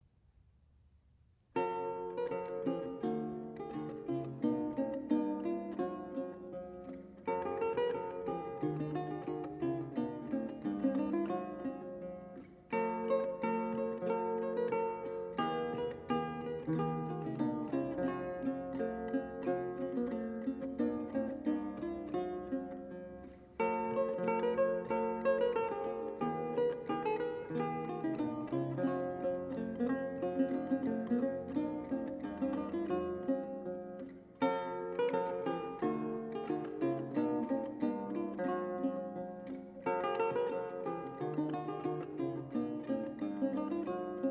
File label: Lute Solo Jig; Kemp's Jig; SnowMusic